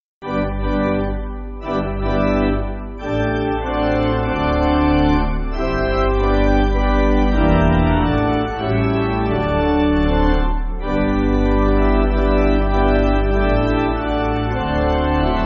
Pipe, Pipe Organ, Music Backing

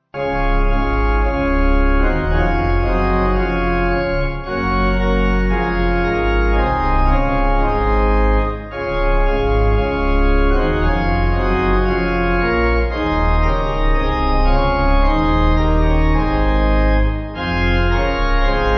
Through The Night, Pipe; St Asaph

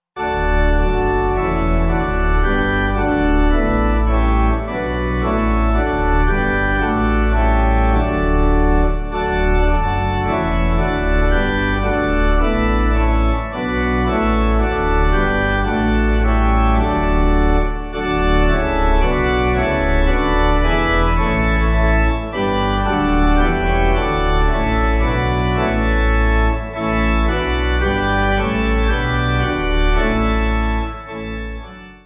Let Us Ever Walk, Pipe

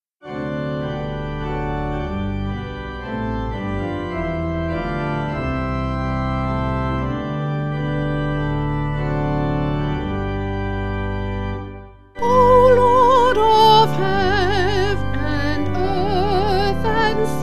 Vocal Organ; O Lord Of Heaven

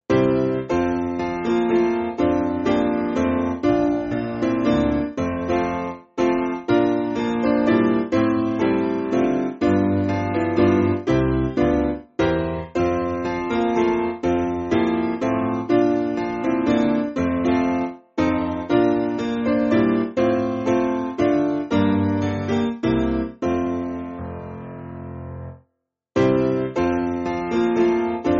Piano, church music; Lets Praise The Creator; Dundee

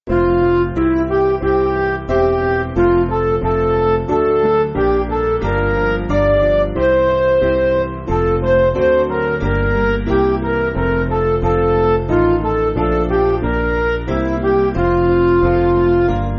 Midi

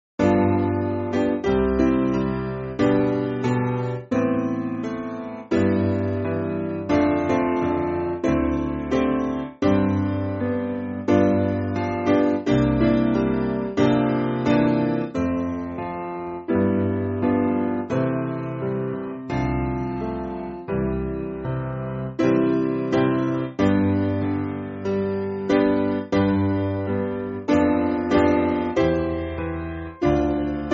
Piano, church music; In The Morning; Give Me Jesus

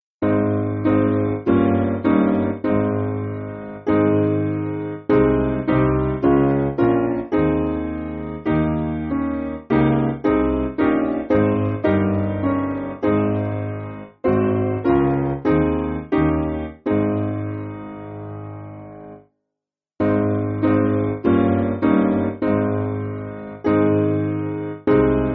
Lord Hear Me Piano; Arthur

